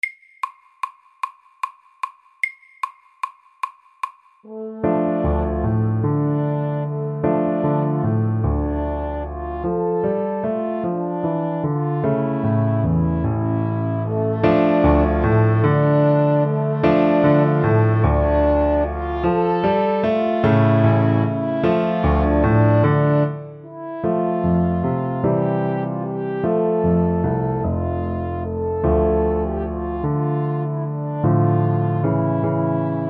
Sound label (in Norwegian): Bugle horn MIDDELS